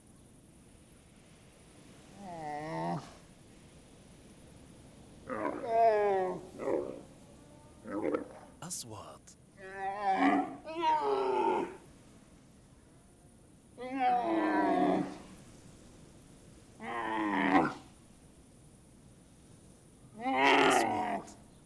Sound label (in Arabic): صوت جمل; sound effect; رمال